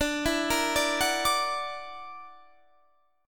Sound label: F sharp Augmented 7th